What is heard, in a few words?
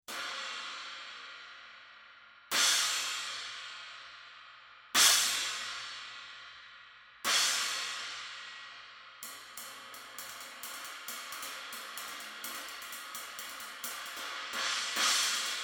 18 Turk Effects crash 18 hole, Bosphorus; cymbal; 1260g